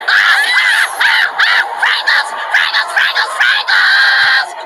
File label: ah ah ah ah; fraggles